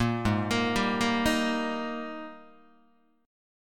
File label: G sharp Major 7th Suspended 2nd Guitar; 1,4,1,5,2,2; JGuitar